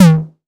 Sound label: 808 TOMS; Wizoo - Platinum 24 Electronic